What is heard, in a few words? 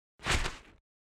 Rolling